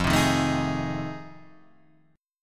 F 7th Sharp 9th, Guitar, Half Step Down; F7#9

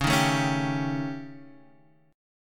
D sharp 7th Flat 5th C sharp

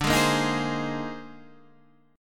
A sharp 11th

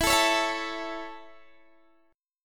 E 6th Suspended 4th

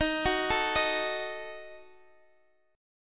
D sharp Diminished, Guitar, Half Step Down, down; D#dim

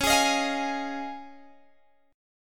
Db Major Add 9th, Guitar, Open G

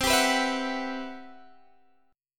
C Major Sharp 11th, Guitar Open G

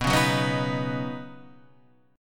D sharp Minor 7th Sharp 5th B

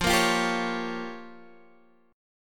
Db 6th Flat 5th

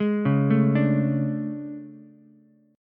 A Major 11th, down Ukulele C6; AM11; arpeggiated; JGuitar

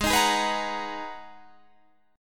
G sharp Minor 9th G sharp, Guitar Standard; G#m9 strummed; 16,18,18,16,19,18; JGuitar

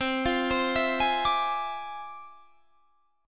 F Minor Major 13th, C

